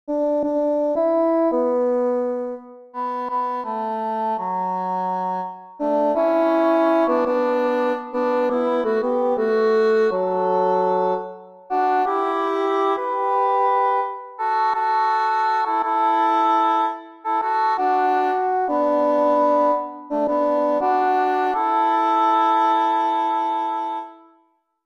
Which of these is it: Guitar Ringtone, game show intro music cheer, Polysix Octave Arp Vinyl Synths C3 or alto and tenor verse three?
alto and tenor verse three